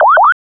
teleport alarm loop; hl2

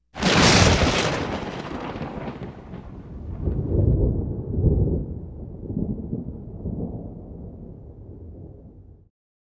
thunder, rainthunder; Sourceforts2.0

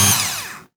saw stop; weapons; Sourceforts2.0

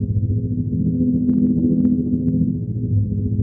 field loop, citadel; hl2

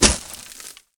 sand impact bullet

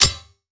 he bounce, hegrenade; weapons; cnsmod

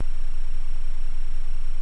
physgun loop; weapons

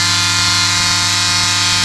saw sawing fast; weapons

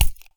button rollover, ui; Construct Beta Files; cnsmod

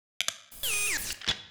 reload, crossbow; weapons; Sourceforts2.0

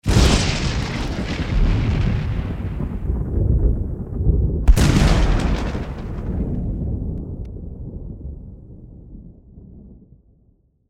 thunder; Sourceforts2.0; rainthunder

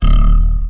jump pad; kakujitsu

